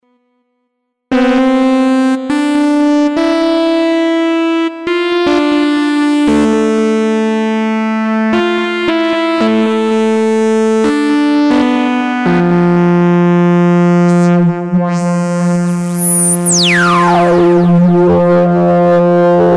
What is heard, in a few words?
Aira System-1